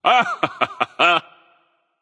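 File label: nonverbal heh heh; smite; voicepack; merlin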